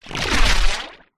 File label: science fiction impact alien body hit hard crack squelch bloody